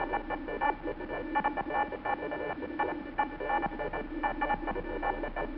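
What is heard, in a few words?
computer talk, ambience; Half Life Model Pack; Half Life 2; hl1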